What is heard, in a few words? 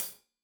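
hat closed, hi-hat cymbals stereo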